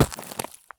landing slate; Escape from Tarkov

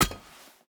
salvaged axe strike soft; Rust

